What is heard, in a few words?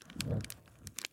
torch swipe; soundscrate